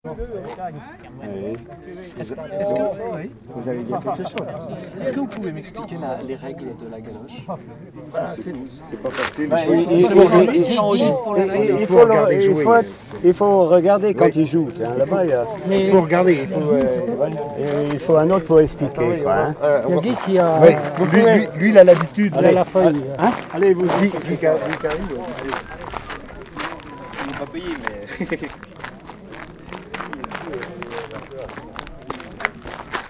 galoche choeur des anciens; lesconil

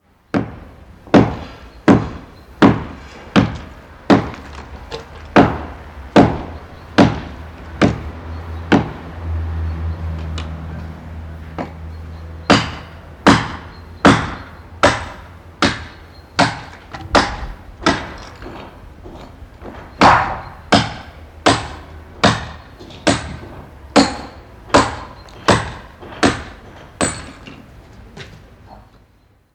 marteau burin, chantier; Culture sonore

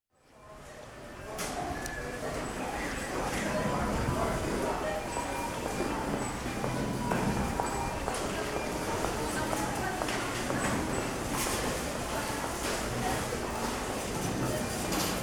Le crepitement des bip-bip; Metabole

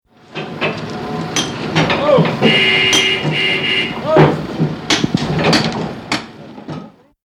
chantier redoublement voix klaxon; Culture sonore

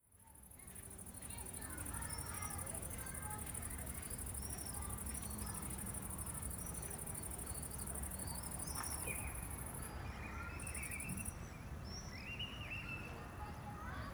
ambiance ludique; nantes

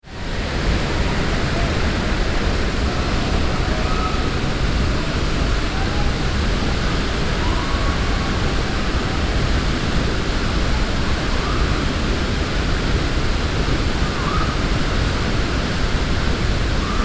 cascade

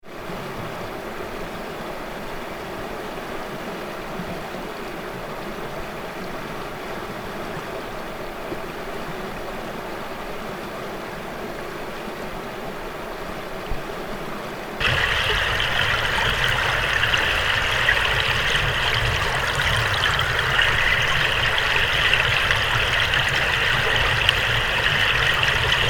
hydrophonie air eau

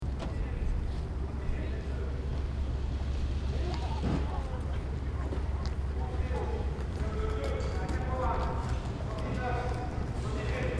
criée; lesconil

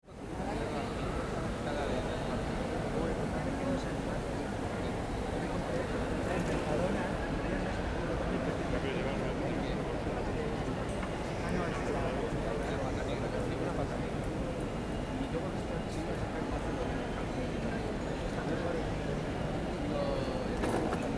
immersion atocha

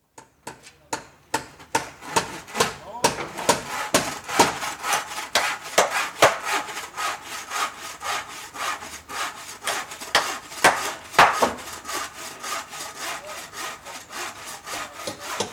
ajustement rythme marteau scie, chantier; Culture sonore

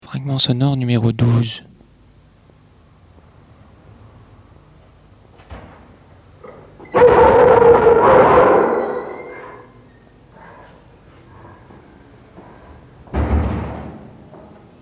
cage escalier, habitat; proxemie acoustique habitat

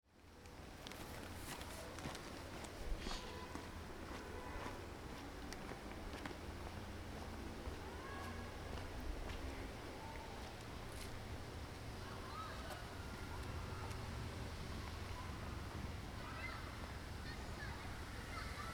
tiznit parcours; resonances oasiennes

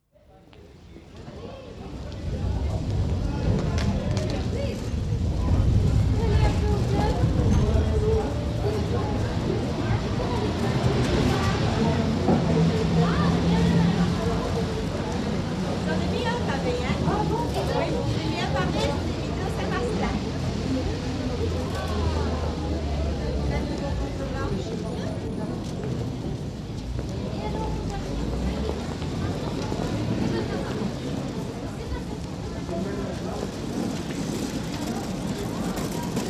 communication interpersonnelle marche

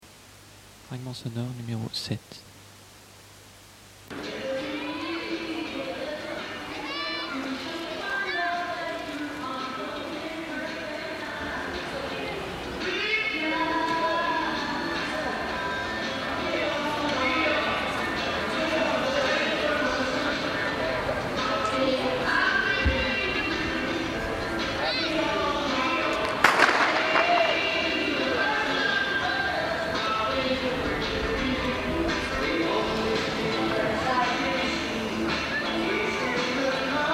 sonorisation cours habitat; proxemie acoustique habitat

